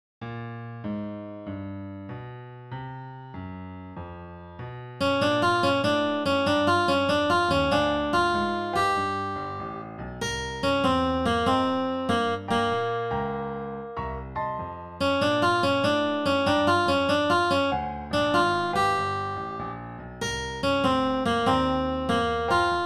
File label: accomp melody